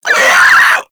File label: Infested Crawler Pain; Warframe